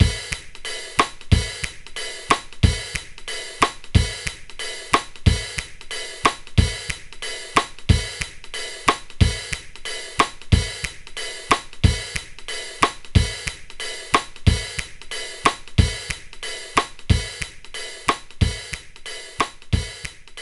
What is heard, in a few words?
Percussion Loop; Tales of Legendia; PS2